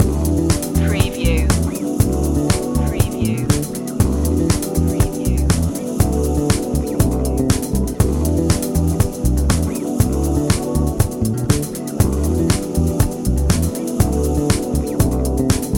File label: big city groove loop